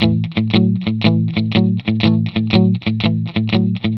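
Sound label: 120 A, Vintage Blues Guitar rhythm; USB Soundscan vol.22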